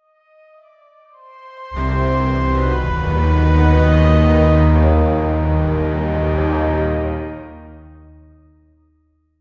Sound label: the music should feel lik, thriller